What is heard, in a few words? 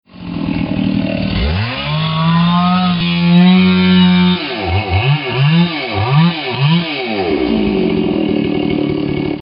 chainsaw